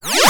FX Scratching; Vengeance Samples

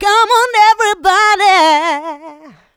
voc, female vox, Vocal House

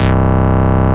Basses Root C; Vengeance Samples

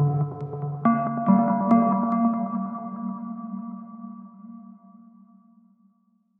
Loop, Synth; Ghosthack

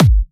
Hard Kicks; Vengeance Samples

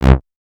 Synth Bass; Vengeance Samples; VEE Synth Shots

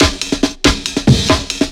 Fill 140 BPM; Loops; Vengeance Samples

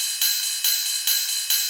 Loop 140 BPM; Vengeance Samples

